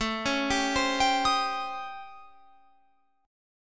A 7th Sharp 9th Flat 5th A, Guitar Open F; arpeggiated; JGuitar; 21,20,19,19,22,22

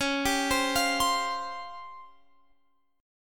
C sharp Major 7th Flat 5th Guitar Open F; C#M7b5; arpeggiated

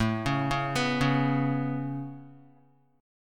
A Major Add 9th A Guitar, chord; Aadd9 arpeggiated; JGuitar

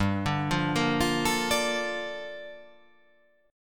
G Minor 9th; chordshape; down

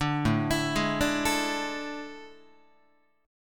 A Major 7th Suspended 4th, Guitar; AM7sus4; arpeggiated

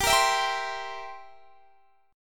C 7th G

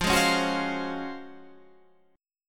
Db Major 11th F

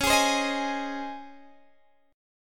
C sharp Major 7th Suspended 2nd, Guitar, Drop D

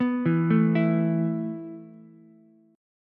E Suspended 4th E, down, Ukulele; Esus4 arpeggiated

